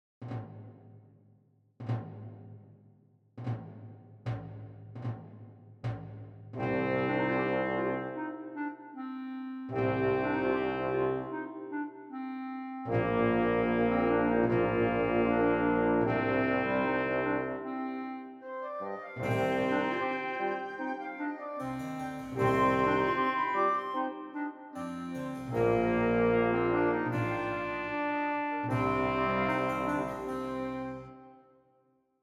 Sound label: old dance